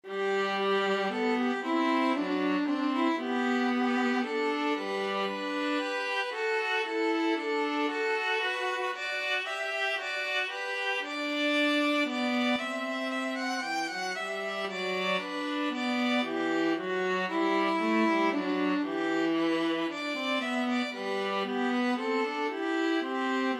violin viola duet

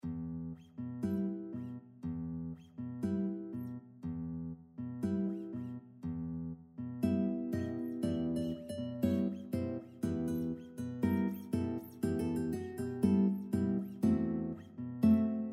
4 guitar, guitar ensemble; bizet

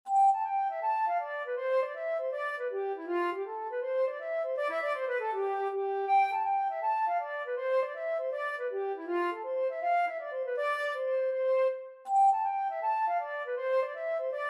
dancing on the green, flute

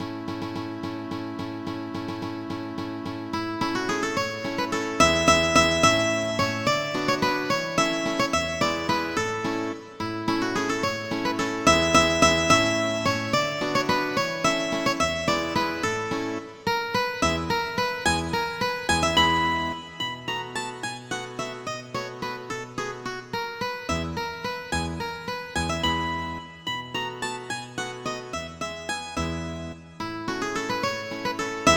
Jubelwalzer, mandolin guitar duet

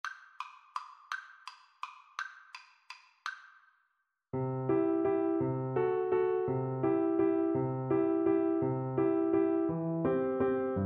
tchaik serenade, Viola

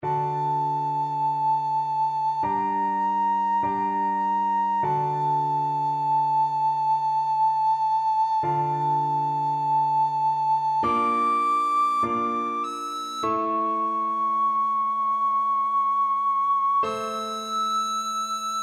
recorder; purcell

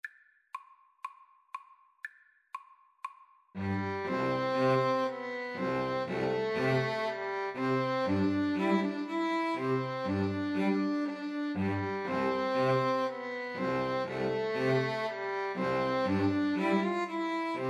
string trio

